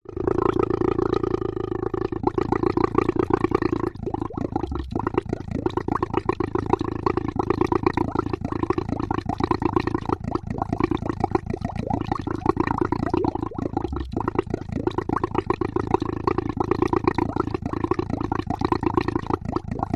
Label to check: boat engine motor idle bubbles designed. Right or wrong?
Right